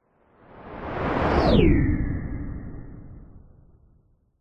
science fiction spacecraft medium sized electric fly by whistle hum fast; zapsplat